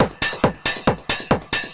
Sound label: 138, Techno drum loops; Techno ID; PS-45